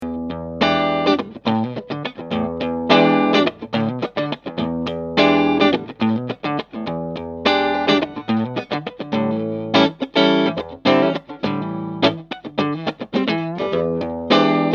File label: Clean SM57 off axis; Bogner